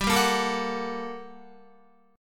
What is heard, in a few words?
G Minor Major 9th, down, Guitar; GmM9 strummed